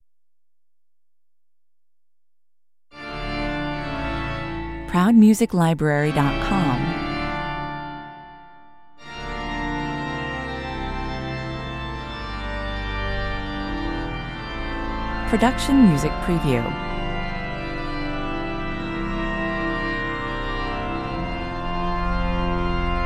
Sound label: church organ